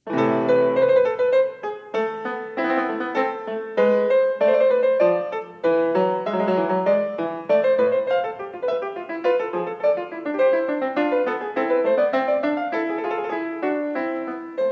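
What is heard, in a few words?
Burlesca, piano; Partita 3 in a; BWV827; Bach; Partitas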